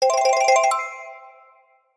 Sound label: Collect Banana Coin; Donkey Kong 64